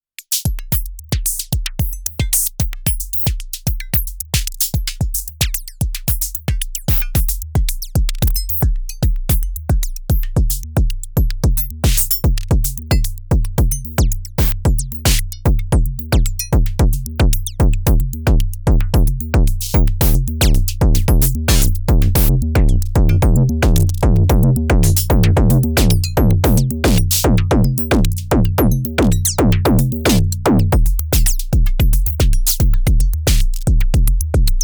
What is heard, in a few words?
Trinity Cycle; Modbap Modular